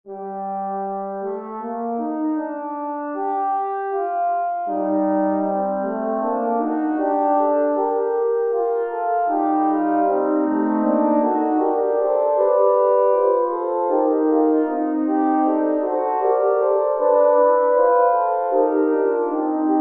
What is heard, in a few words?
Canon 3 Horns